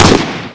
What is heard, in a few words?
bar fire, weapons; Spearhead Demo; MacFormat 2003 June